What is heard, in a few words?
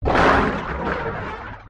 impact design hit crack lo fi chord background flange wide; Skyclad Sound